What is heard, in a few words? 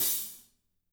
16 HAT, DRY KIT